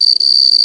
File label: cricket; sfx cricket